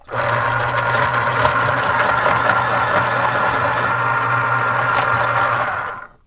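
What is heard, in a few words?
pencil sharpener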